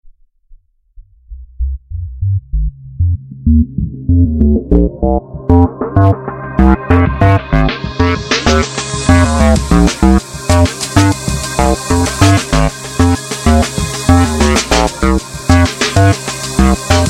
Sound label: instrumental